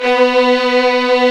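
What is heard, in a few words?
violins, fff; String Master Series; Vlns 8 fff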